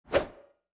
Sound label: Med Swosh; Fancy Pants World 1 Remix